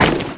Blocks Destroyed; fowl

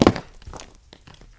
Stone Collision; fowl

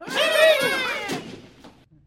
Bird Red Slingshot; fowl

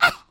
Bird Black Damage; fowl

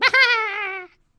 Bird Black Selected; fowl

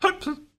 Pigs Collision, Small And Medium; fowl; Pigs Set1

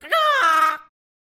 Bird Red Special; fowl

